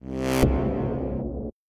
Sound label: Boss Attack